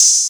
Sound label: Open Hat, Hip Hop; Drum One Shots; Break